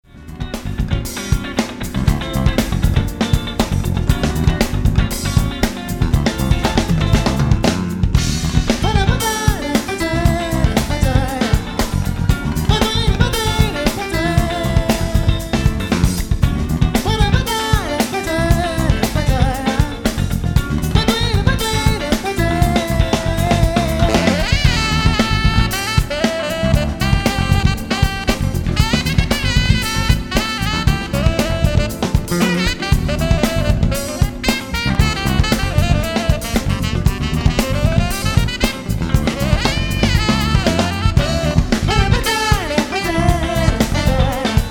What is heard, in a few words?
japanese instrumental live version